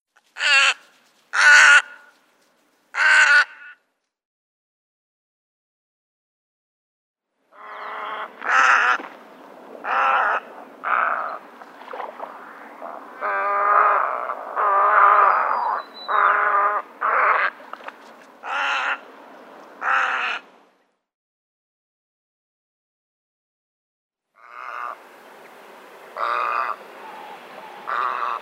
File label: Western Reef Heron